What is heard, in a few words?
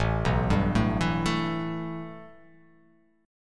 A 7th Suspended 2nd